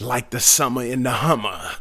Rap Vox

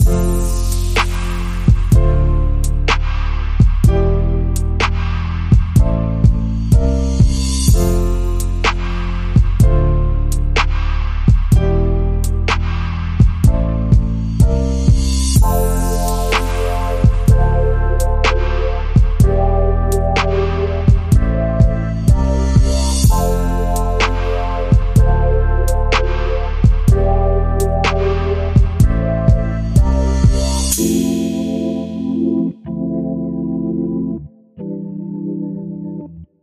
Gospel Blue

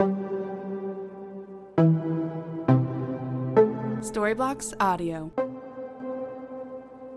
Ambient House Pad 134BPM A

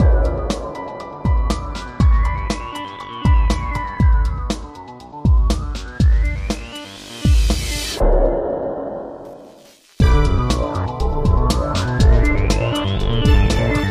dark moddy electro music cue

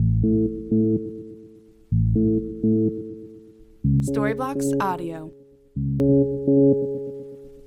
Ambient House Bass 125BPM A

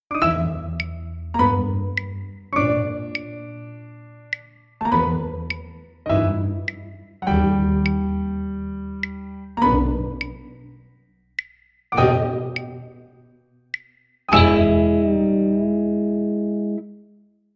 Plink Plonk

